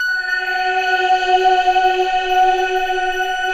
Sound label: mystery pad; Optical Media International; Sonic Images Library; Mellowery